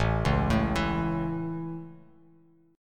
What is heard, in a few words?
A Major, Bass Standard; arpeggiated